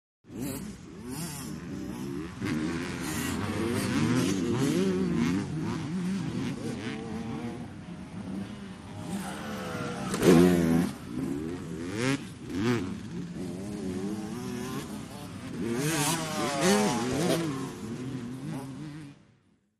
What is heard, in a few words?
Jumping By 250 Cc ( Several ), Bikes Drive From The Left, Jumps Over The Jump, Lands On The Right And Races Away, Shot Cu At The Jump.; Motorcycle Motocross